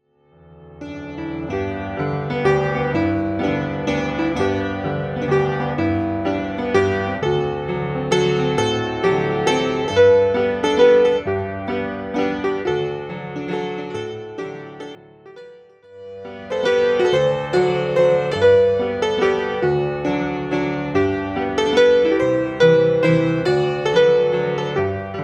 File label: Cowboy Waltz